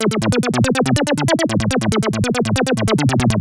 Bass

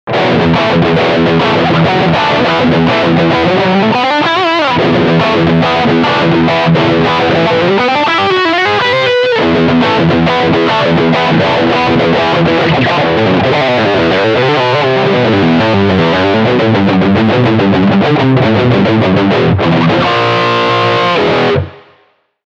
High Gain, uk

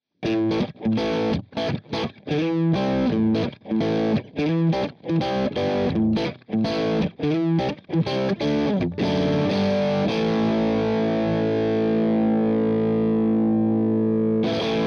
Riff Fingerstyle Torpedo Orange 2x12

